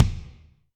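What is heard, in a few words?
Kick 24 Inch Room, Alesis D4; Kik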